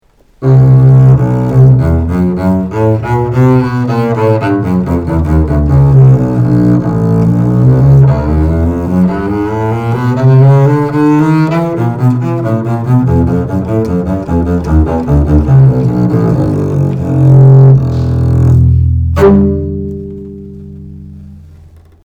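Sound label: antique double bass sound sample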